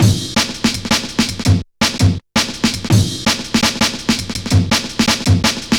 Drum Loops, Jungle

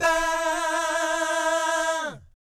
VIB FX, Vocal Planet Jazz & FX; ILIO; JAZZCOMBO

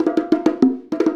Bongo Fill; Essential Percussion

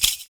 FOOT PERC; Univers Sons - Raricussions

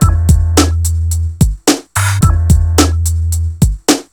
BASS, Synth FX Misc; E-MU Producer Series Vol. 8 – Platinum Phatt

